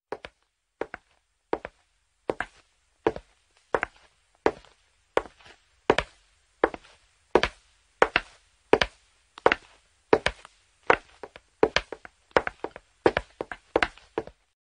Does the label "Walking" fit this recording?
Yes